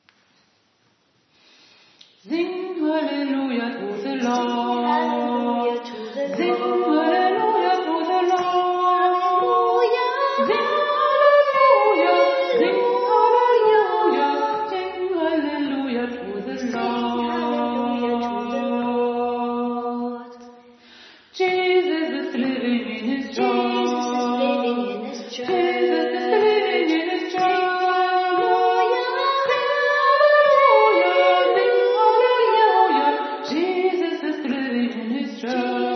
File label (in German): Sing Halleluja englisch